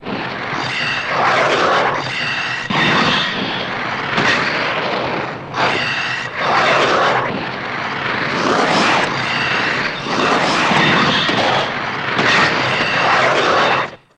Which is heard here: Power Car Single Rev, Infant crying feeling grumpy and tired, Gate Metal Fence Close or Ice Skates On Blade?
Ice Skates On Blade